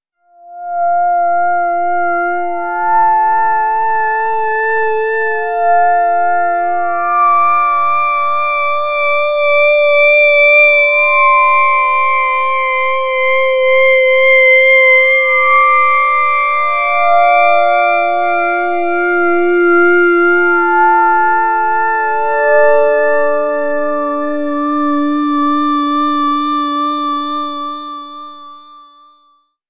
FM Solo; Melodic; Sonic Foundry (Sony Creative Software); Slow Silhouette

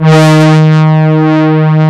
MOOG BRAS, Moog Synths; E-MU Formula 4000 Series Vol. 3 – Analog Odyssey

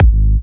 Jump Kicks, Bassdrums

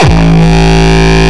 Longkick, kicks

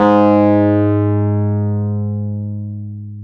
PNO, Wurly

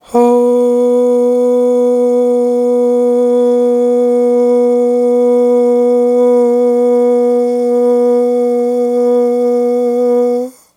AHHH, Vocal; Polyend

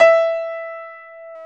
PIA HIGH G, Grand

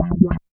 B. TRILL; East West - Phat & Phunky CD2; 90's Sample CDs

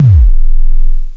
KICK LO, Klone Dual Percussion Synthesiser

